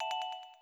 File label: pleasing bell